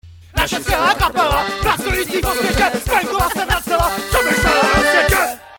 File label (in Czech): Dechovka